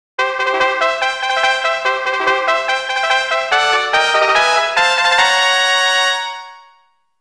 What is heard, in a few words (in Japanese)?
そしてヤル気のファンファーレ; Monster Farm 1 2